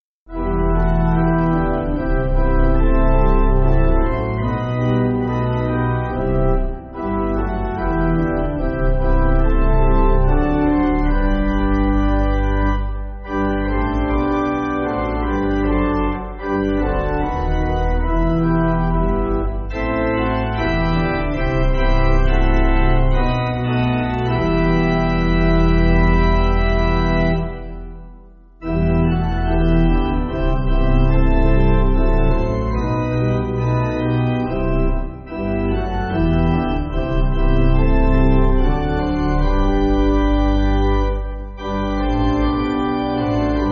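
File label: Pipe Organ, Backing Files for Churches; We Are Ready; Swedish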